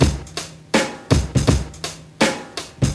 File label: 82 bpm 90s hip hop drum loop c sharp key, Free drum groove - kick tuned to the C# note.